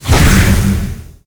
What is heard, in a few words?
skill explo